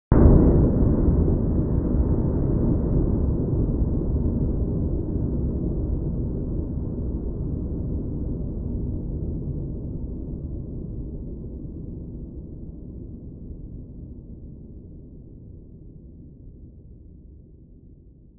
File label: Impact Echoed Metall